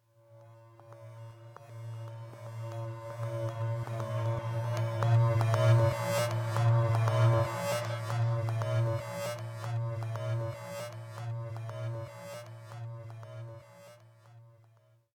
BGM Lobby; metal mind; xbox series xs

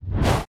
Attack Dark Default

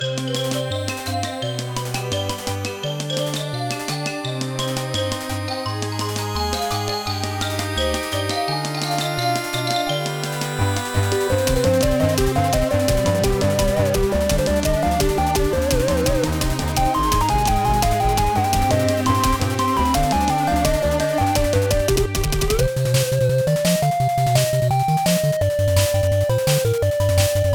Ready Fire GO; Jones On Fire

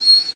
Wolf Hit; The Battle for Wesnoth

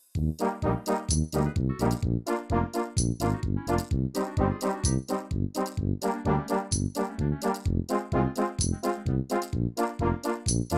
Over World

